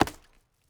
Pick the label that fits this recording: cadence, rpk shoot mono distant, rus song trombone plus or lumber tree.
lumber tree